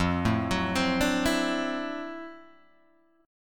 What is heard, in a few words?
D 9th F down Guitar Standard; arpeggiated; JGuitar